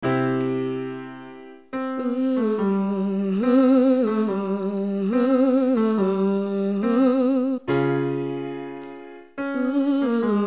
Exercise Fall off Trill Down

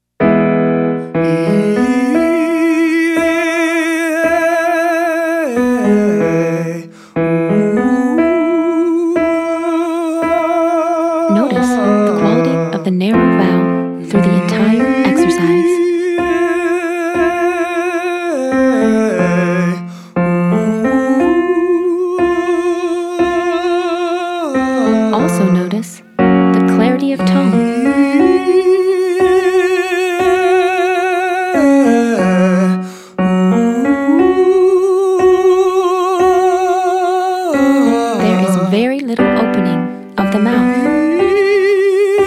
vowel changes male; Singing

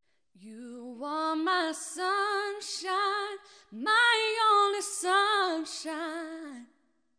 female dynamics in R & B

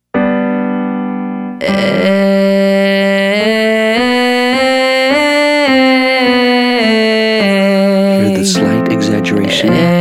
Vocal Fry female male

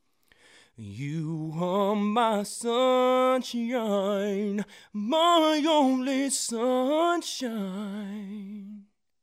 dynamics in R & B

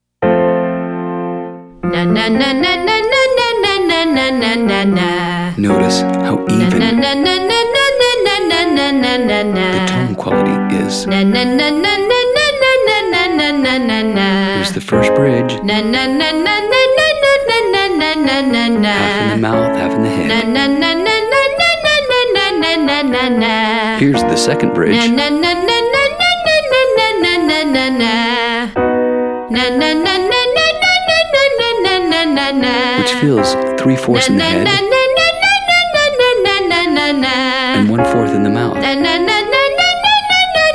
Exercise ne ne female; Singing

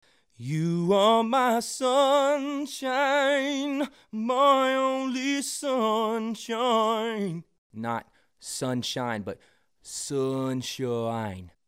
vowel modification in R and B

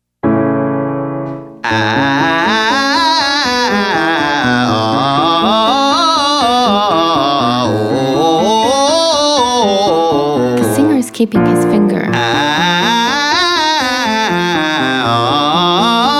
Exercise 6 high middle low male; Singing

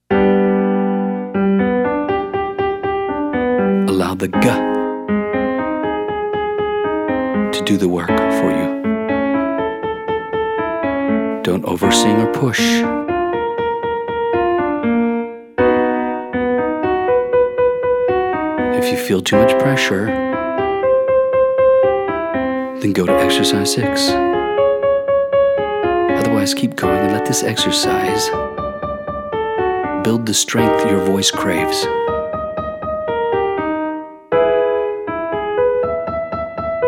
Exercise 4 your turn females

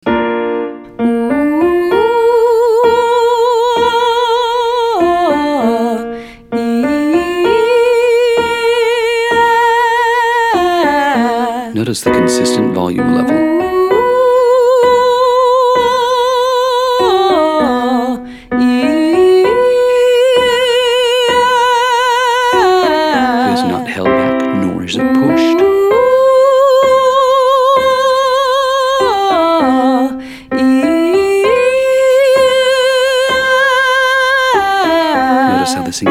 Exercise 6 Broad Vowel Changes female

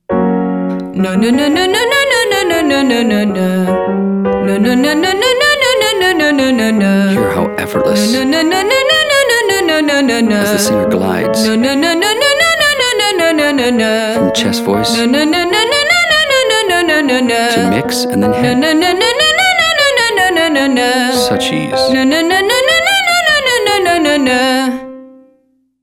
females

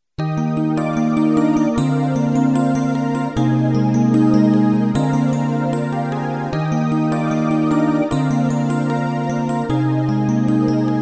Improv chords Solo

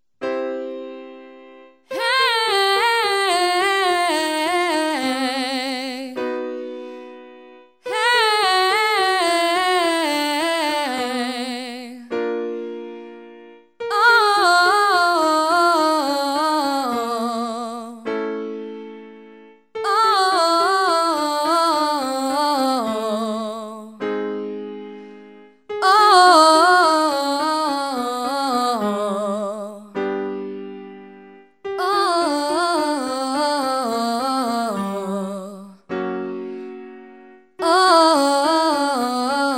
Exercise minor penta over major

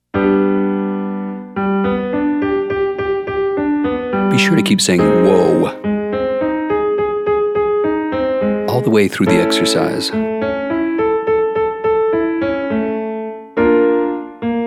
Your Turn female; Exercise 2; singing lesson

